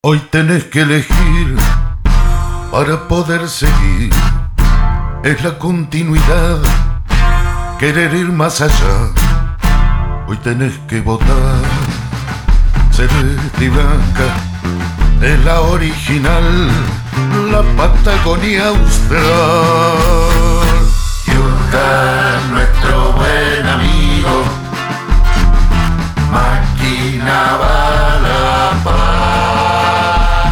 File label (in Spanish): Jingle de Campaña